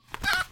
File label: Chicken Startle; Bugs Bunny - Batter Up!